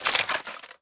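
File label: inspect movement, weapons; Valorant; cstrike; bulldog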